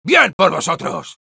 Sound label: Sniper cheers, es; ¡Bien por vosotros!